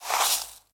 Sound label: Cookies Powder Scoop